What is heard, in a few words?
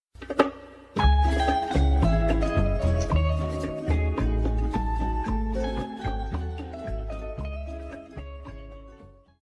notification ringtone; narcos